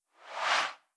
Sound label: BG Scroll; blue archive